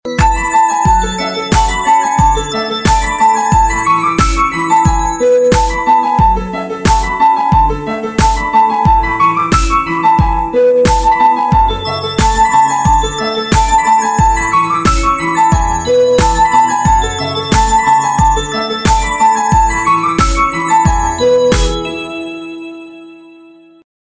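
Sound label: festive beautiful melody; Праздничная новогодняя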